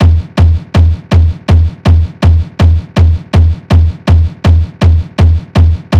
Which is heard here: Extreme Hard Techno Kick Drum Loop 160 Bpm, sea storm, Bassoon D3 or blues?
Extreme Hard Techno Kick Drum Loop 160 Bpm